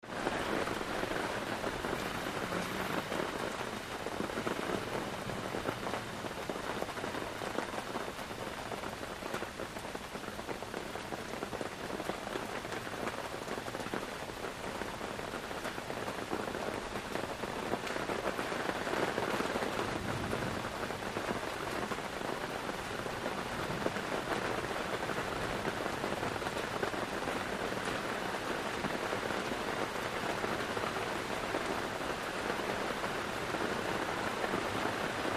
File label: Rain Inside Tent; int